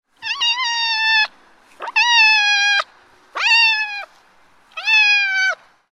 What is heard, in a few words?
Cat meowing loudly